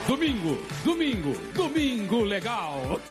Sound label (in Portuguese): vinheta acapella; domingo legal